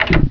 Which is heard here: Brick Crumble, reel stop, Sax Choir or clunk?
clunk